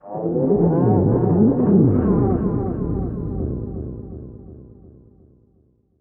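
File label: deep house FX, 125 bpm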